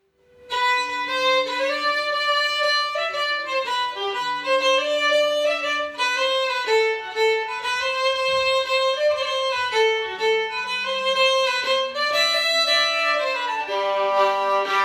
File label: Gumbo Waltz A melody